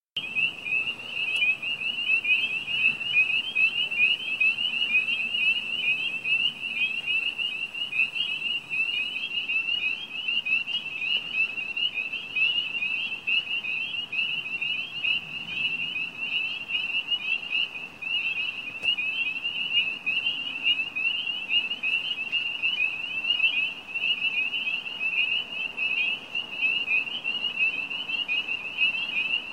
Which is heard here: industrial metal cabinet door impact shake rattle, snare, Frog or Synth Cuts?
Frog